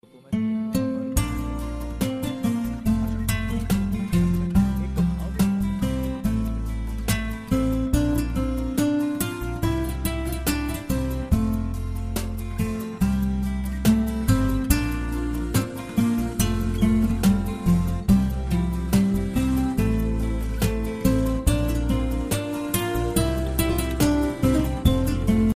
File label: classic guitar